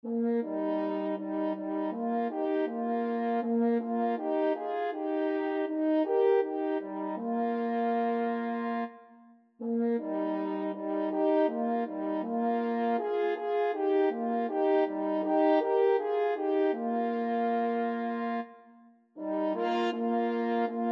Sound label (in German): horn quinten